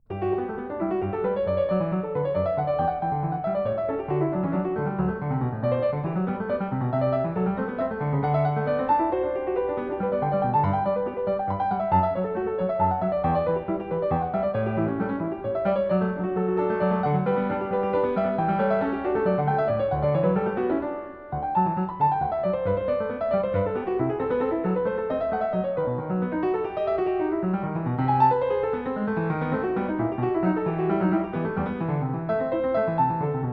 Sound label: Clav. (Binaural); Bach; The Goldberg Variations, BWV 988; Zenph Re-performance; Variation 1 a 1